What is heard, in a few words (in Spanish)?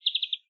ave; la-chaux; choir of species